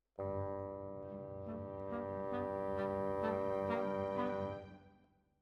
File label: Bassoon, Jazz for piano